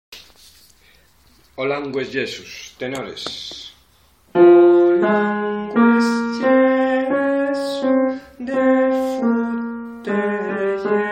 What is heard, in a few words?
tenor